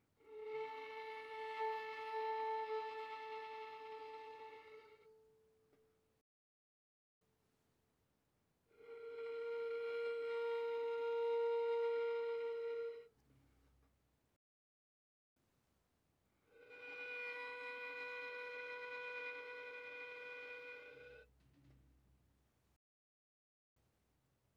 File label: Violin arco pp sul G A4 Gb5